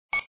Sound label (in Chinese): 嘀; 创维移动+vika 音乐与声效